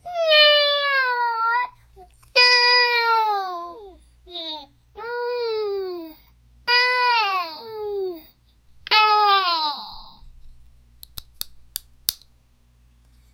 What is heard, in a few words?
kid struggling to pull; child